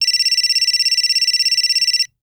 Cell Phone Ring; Communications

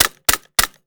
Staple Gun 3x; Tools; 1001 Sound Effects

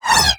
Space Gun; Sci Fi; 1001 Sound Effects

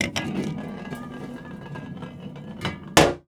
Object Spins and Falls off Counter; Kitchen

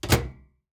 Microwave Door Close; Appliances

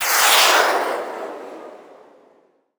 Missile; Weapons; 1001 Sound Effects

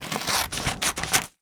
Cutting Paper with Scissors; Office Supplies; 1001 Sound Effects

